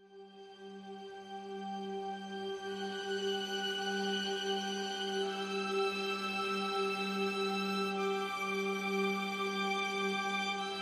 No Piano

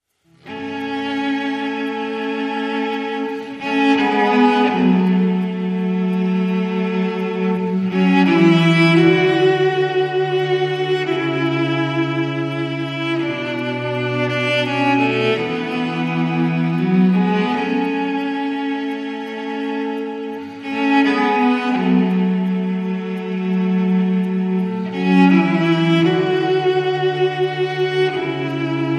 Cello

